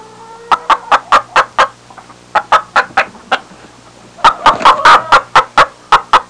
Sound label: Hen laying eggs